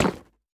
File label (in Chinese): 下界砖破坏声